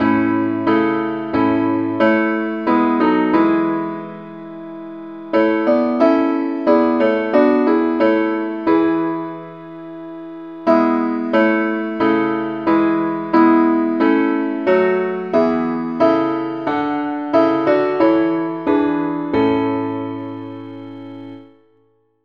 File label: parts only, key A